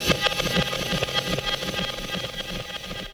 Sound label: Dub FX, dub hits; Sonic Foundry (Sony Creative Software); Ambient Grooves